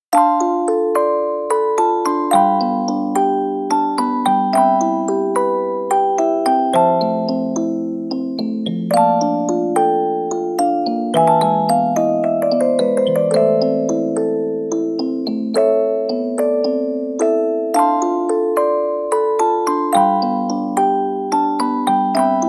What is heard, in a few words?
Music Box